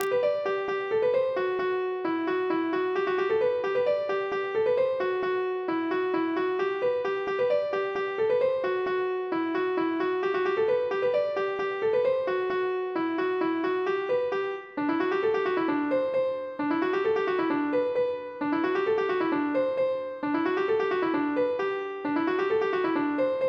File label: polka; boeuf trad